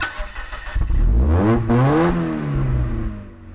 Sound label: Motor; MotorLigar; Quando o carro aparece em cena, é produzido o som MotorLigar